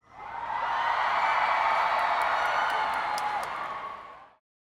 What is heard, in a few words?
Crowd Cheering Interior, Female Crowd, Short Swell, Arena; Staples Arena Los Angeles; Surround; 5.1 LCRLsRsLf; 2496SoundEffects; Surround at the Show 2 5.1